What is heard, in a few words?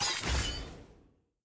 reward generic; tft sf2023